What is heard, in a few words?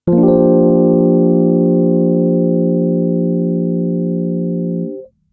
susadd6